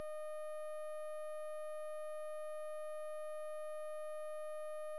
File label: elec noise; prosim